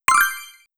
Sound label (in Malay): Kesan Bunyi Kumpul